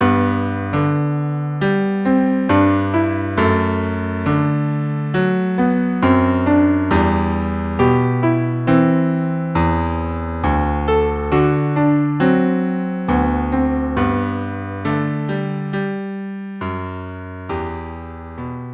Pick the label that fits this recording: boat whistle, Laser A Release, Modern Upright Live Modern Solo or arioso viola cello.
arioso viola cello